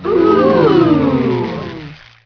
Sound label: ooohhh